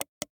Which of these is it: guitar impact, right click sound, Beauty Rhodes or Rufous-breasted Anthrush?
right click sound